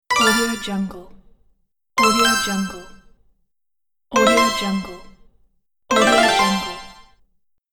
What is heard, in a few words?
success